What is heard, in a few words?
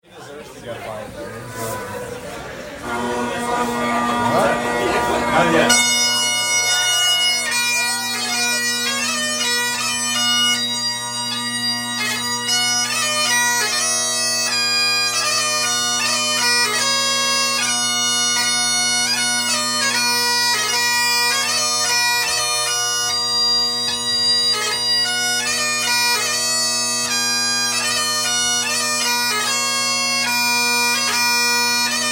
bagpipes, Burns Night, Brooklyn, New York; Lauren Sharpe